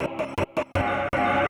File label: Industrial 160